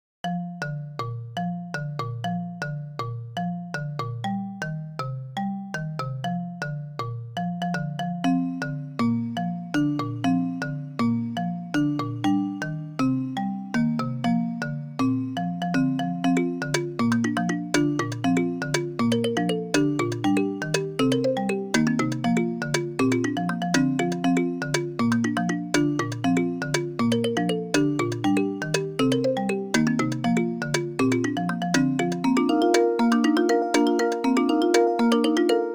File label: Xylophone